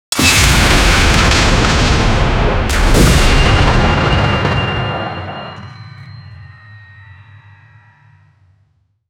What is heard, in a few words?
anime